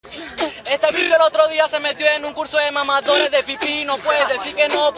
rap venezuela

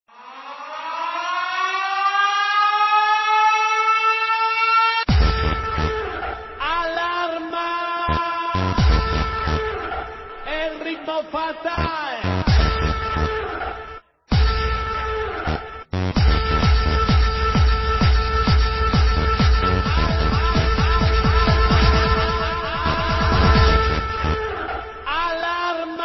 alarma

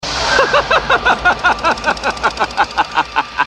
risa; chucky

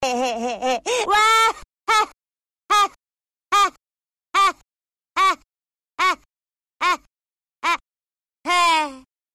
risa sarcastica; shinchan

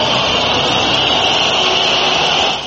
pitidos furbo

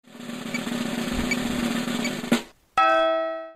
bateria suspenso; alvarof

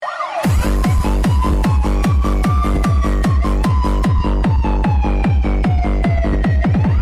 sirena de policia remix hardstyle

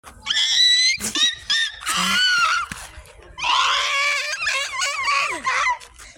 risa insana

